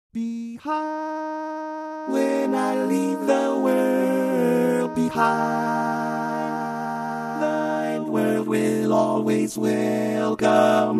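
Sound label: barbershop Baritone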